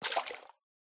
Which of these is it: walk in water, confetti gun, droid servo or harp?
walk in water